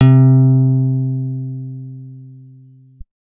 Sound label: guitar acoustic, acoustic guitar nylon